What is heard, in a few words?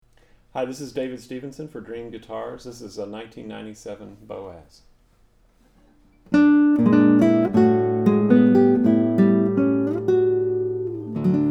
concert guitar; boaz; classical; nylon string